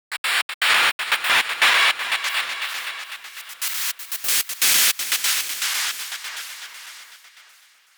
Gated Noise 120BPM; musicradar

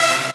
Synths Root C

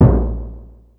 Surdo Open, Yamaha DD-35

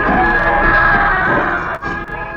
monster; Sonic Foundry (Sony Creative Software); Ugly Remnants VOL-2; UR 2 FX (Long)

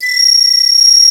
Guatamalan Bird; Planet Earth; Digital Sound Factory Planet Earth

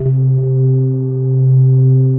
SINE MELTS, pads; Pro-Rec - Synthsonic; TR PADS

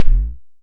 Kick Fat Bend, Tama TS-206

